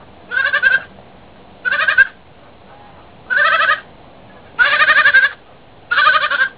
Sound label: call mama, goats